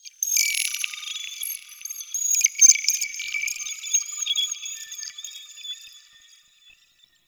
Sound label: crystal bird; Warframe; Tau